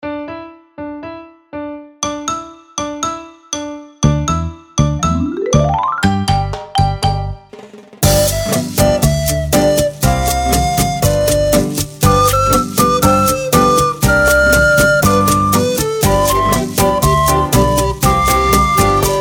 Rhythm And Syncopation; Schule fuer Altblockfloete 1

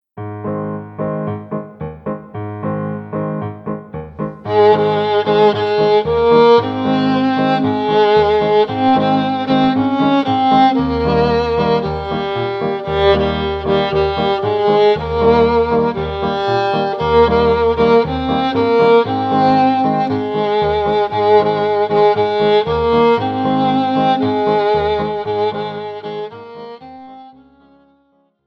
Bossa Nova, Viola; Fiedel Max 3 Viola

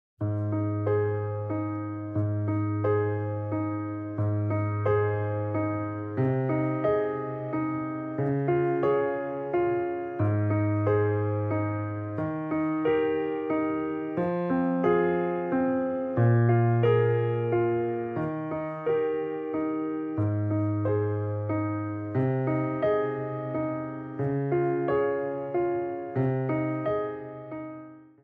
Slow Waltz (Mitspielversion), Violine; Fiedel Max 3 Violine

